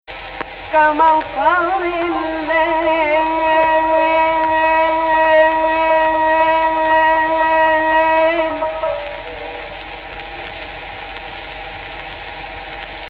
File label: AHH, Egypt Rast